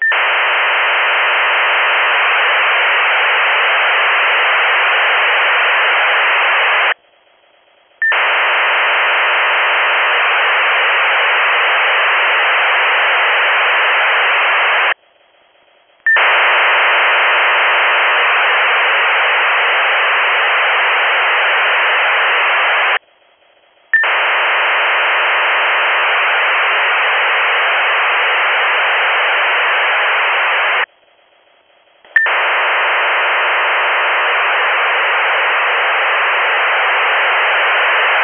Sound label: Bulgarian diplo 17440kHz; MFA Sofia